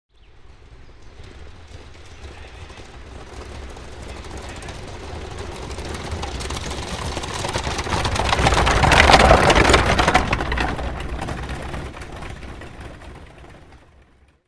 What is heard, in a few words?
Horse Drawn Carriage By Fast; Horses Carriages and Traps; Hollywood Edge Historical Series